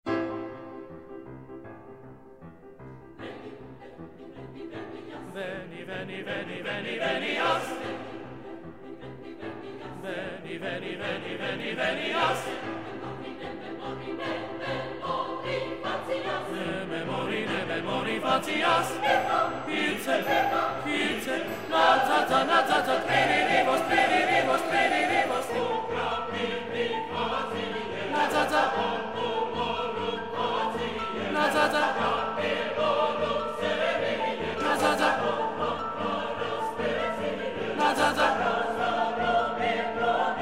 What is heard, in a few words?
Tenor 2; Veni veni venias; Coro 2